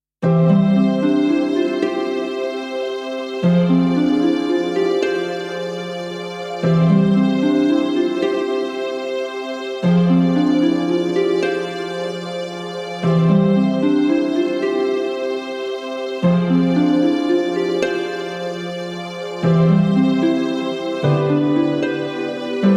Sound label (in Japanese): ジングル 癒し BPM75